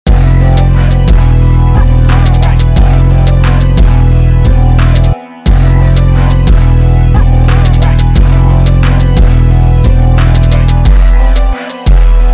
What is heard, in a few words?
jerk type beat